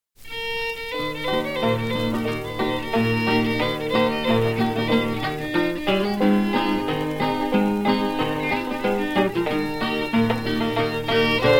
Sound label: Blues